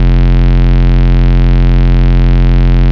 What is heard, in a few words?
Root F, Bass